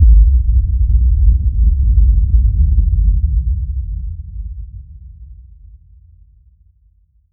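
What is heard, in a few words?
deep vibrating extra bass; atomic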